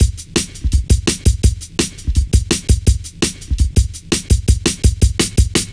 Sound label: Fat Stepz, Drum Loops 167.5